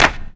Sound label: CP, CLAPS, House Side Of 2 Step; USB Soundscan vol.51; AKAI